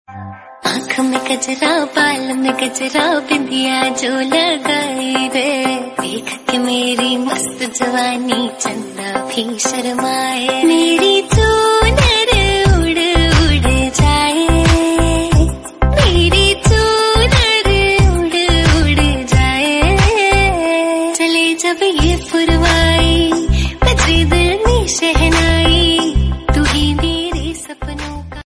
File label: Hindi Song